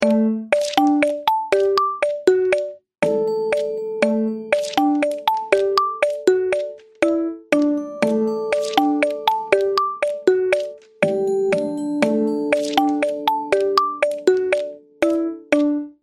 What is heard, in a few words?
note 10 ringtone, samsung; oberon